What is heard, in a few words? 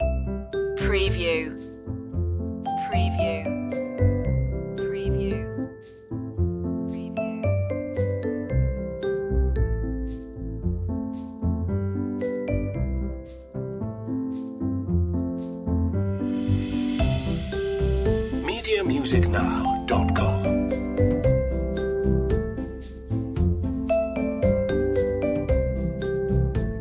clap skip jump no ooh vocals